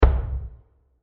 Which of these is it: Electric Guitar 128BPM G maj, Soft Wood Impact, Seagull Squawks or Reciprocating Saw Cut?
Soft Wood Impact